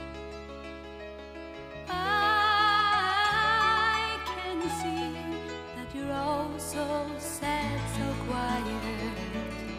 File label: so sad so quiet